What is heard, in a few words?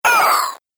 Robot Droid Death, Robot Sound Effects